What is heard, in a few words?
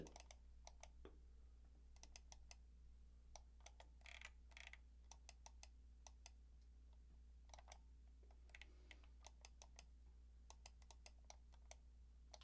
Computer Mouse Clicking